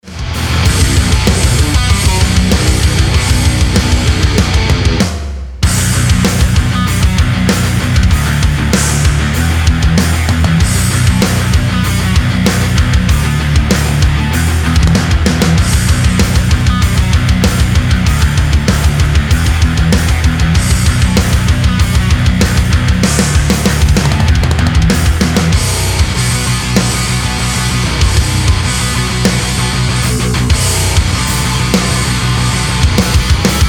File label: hrd rock